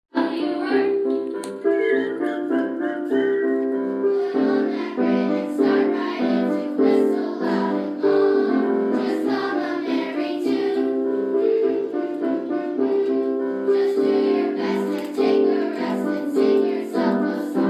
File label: chorus performance